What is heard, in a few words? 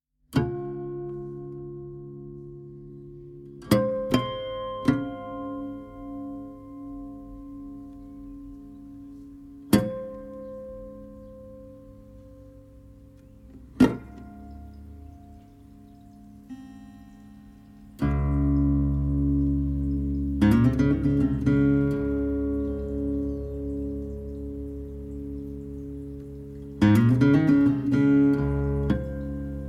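bouzouki baleine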